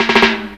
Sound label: TIMBALS; Ragga & Dub Reggae